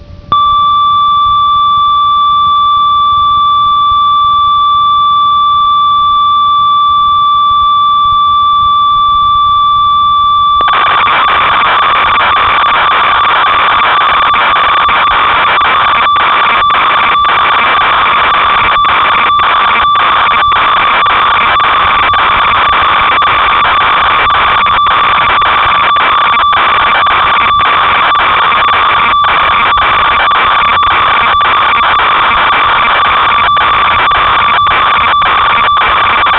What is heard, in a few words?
ob6, synth